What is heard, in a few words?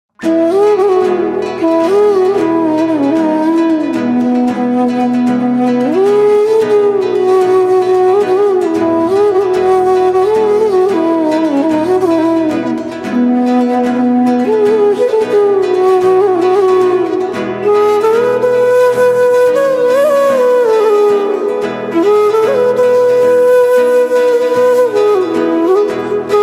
flute instrumental ringtone